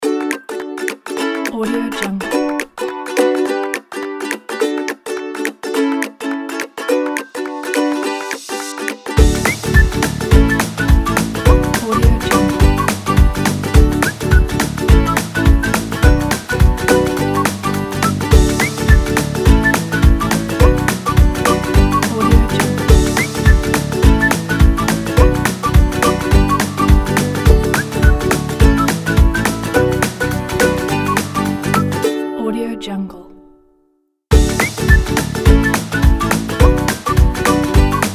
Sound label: for fun music kit